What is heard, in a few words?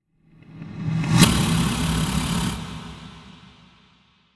hit spinner, whooshes